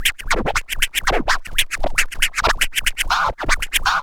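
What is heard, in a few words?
SCRATCH SEQUENCES; AMG Megabass REMIX! VOL-2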